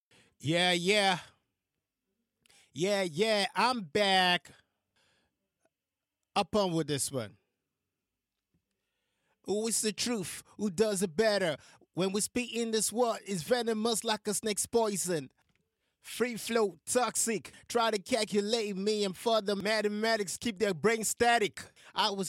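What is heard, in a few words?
hip hop 156 D major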